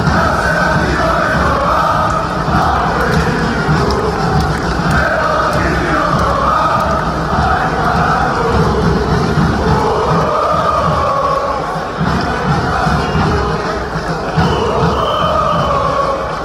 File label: fanchants